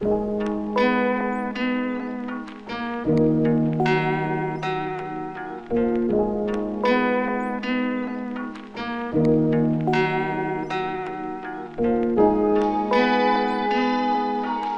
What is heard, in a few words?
158 BPM D# Min, Vintage & RnB Melodies; Fill Up My Mind; Cymatics